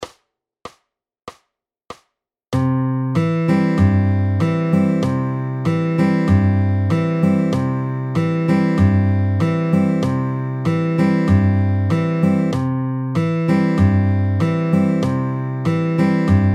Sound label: Slow, fingerpicking patterns